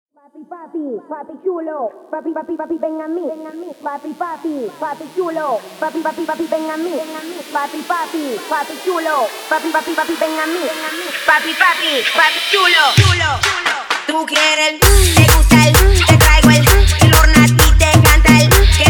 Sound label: intro outro 130 bpm